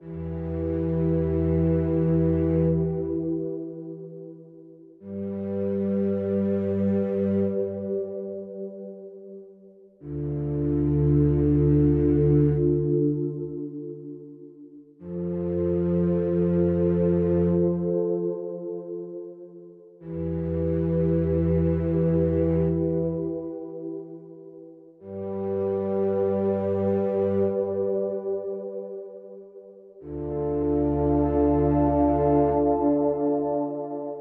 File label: Evergreen, No Mallets No Bells